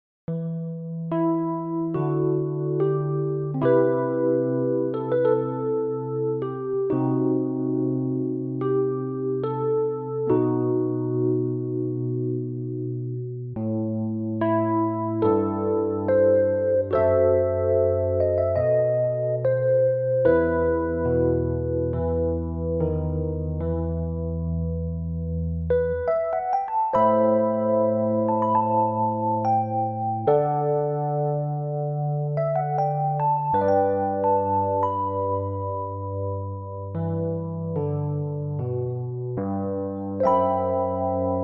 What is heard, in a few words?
In the Rain, RPG Sad Theme, Fantasy Game Over Background Music